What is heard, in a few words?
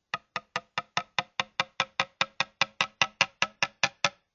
Wide mug with fresh cocoa